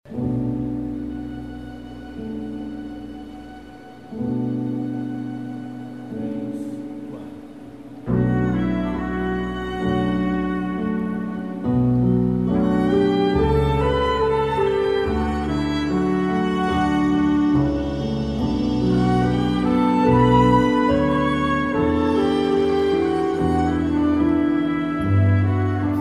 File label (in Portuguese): Concerto de Aranjuez Piano e Cordas